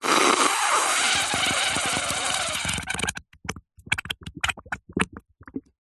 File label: food drink thickshake straw blow air in strained into small bubbles